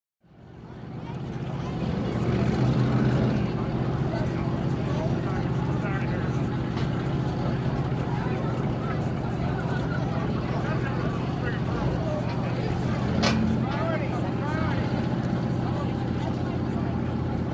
Power Boat Pit Ambi, off shore power boat

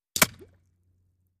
Bullet Impacts Water

